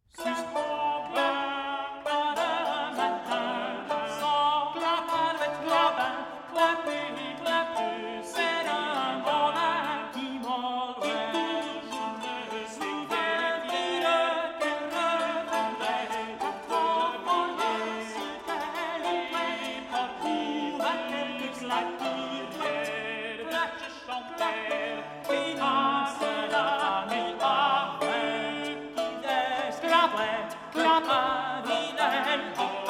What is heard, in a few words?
clap clap; medieval; Clap, clap, par un matin